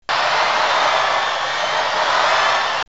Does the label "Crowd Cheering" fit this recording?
Yes